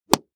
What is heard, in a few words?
Weapon Staff Hit Body Fast